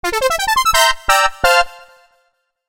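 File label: Bright Synth Notification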